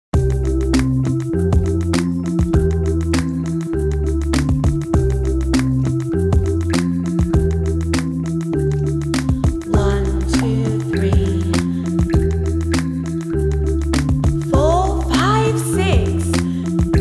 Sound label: Raindrops karaoke